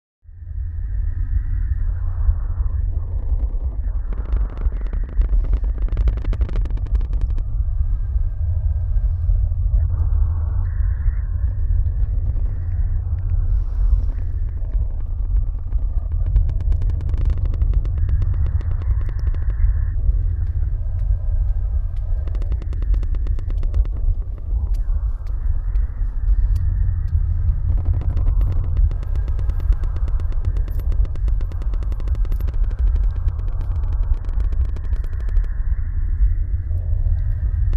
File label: ensemble